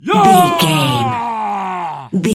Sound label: voice male battle shout long